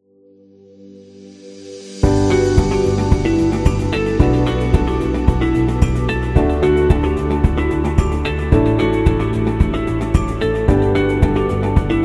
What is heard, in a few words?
Corporate Ambient